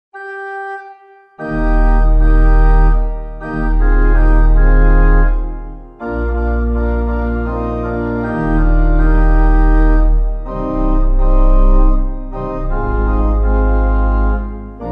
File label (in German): Orgel; Jesu, gedenk an mich